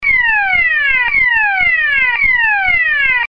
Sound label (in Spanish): sonido electronico